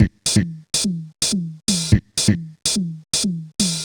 perc it 125, Percussion Loops, Classic Chicago House